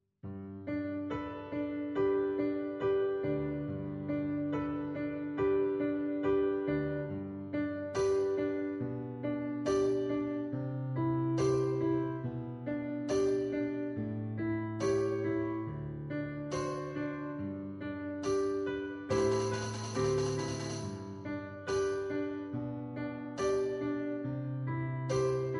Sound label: farmyard capers slow ballad; Inrescendo; string orchestra; McAllen String Academy